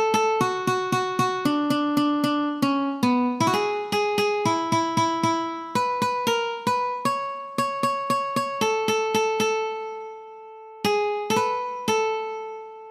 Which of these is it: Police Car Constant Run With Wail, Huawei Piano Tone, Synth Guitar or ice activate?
Huawei Piano Tone